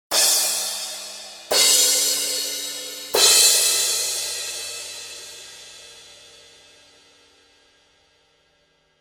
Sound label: Paiste Crashes